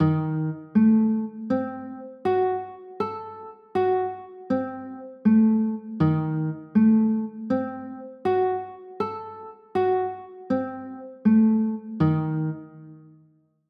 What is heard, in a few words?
e flat dim7; chords